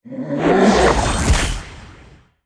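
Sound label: ghost chef attack, monster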